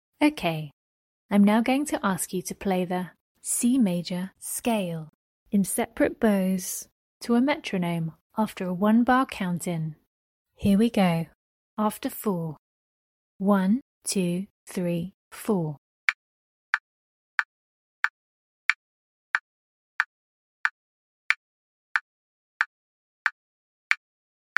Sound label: c maj scale sep bows